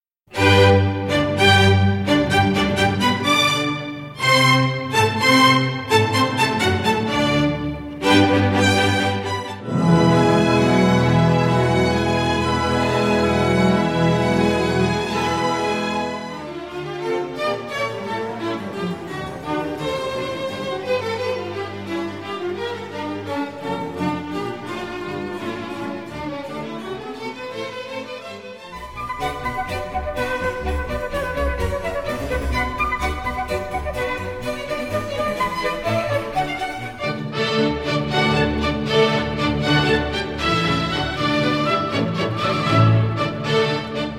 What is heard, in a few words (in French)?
Classique